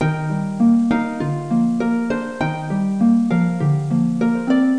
harp good; Education Publishing Concepts

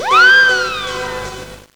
ouuip; Vrtulnik

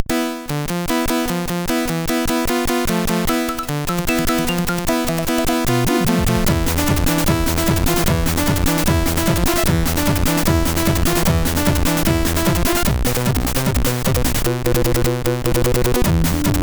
Piano tech

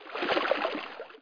water walk; Player; Heretic II

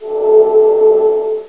SPELL

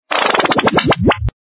santa hit; magic heaven

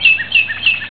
Hippogriff Death; Mythos

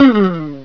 Ray Deflects; Mystery Box 2.0.1

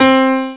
piano ac; Amiga Special - Software Hits; orchestra; keystring

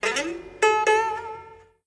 play lute; Immortal

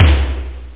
toms, room; perc; OctaMED SoundStudio V1; jazzies samples

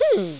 hmm; Garden

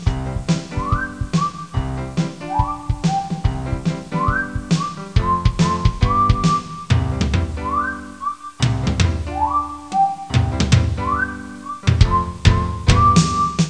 New Mus; Bible Fun Pack; Education Publishing Concepts; NOAH

